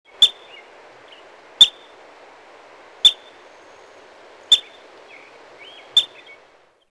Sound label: Woodchuck